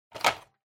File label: Intercom Handset Pick Up Mid